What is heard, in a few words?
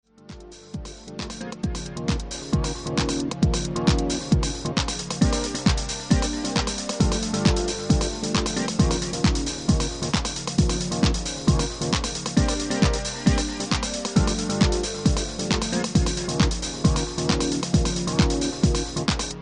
Drum Ensemble